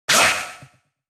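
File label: attack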